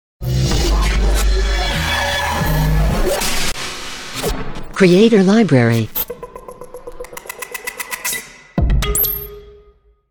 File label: Hi Tech Logo; Logo-Idents